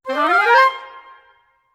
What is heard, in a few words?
Woodwind run C min (up); PowerFX; Downtown Orchestra; Runs